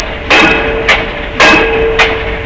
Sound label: FACTORY, MACHINES